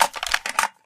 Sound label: rifle clip in; weapons; source engine; left4dead2